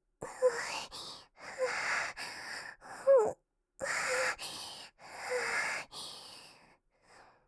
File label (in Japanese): 乳首舐められ (吐息多め); カスタマイズ用音源